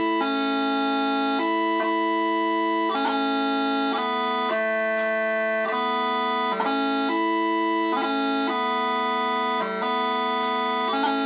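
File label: Going Home, bagpipe tunes; celebration of life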